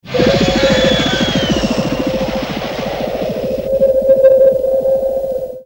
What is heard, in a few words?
Howl echo; Airwolf